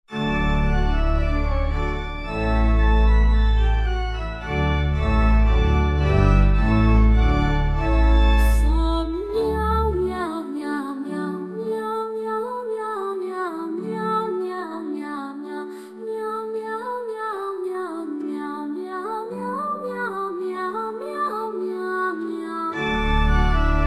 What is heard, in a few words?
Meow Church; MusicBoxAI